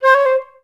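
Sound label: flutes C4; Best Service; Peter Siedlaczek's Advanced Orchestra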